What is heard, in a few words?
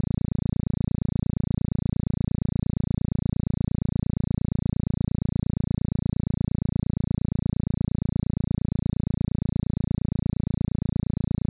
Pulse 35ms IPI